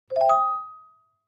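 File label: Clavichord Short Arpeggio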